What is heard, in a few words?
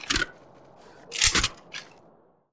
reload; Tacz1.1.5+; RSWS 1903